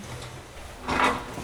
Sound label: Vehicle reloads; Red Orchestra: Ostfront 41-45